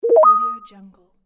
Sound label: new message